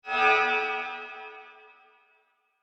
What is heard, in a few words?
Guitar Chord Musical Transition Reveal Chord Swell; Sound Design; Guitars (Sound Effects:Musical)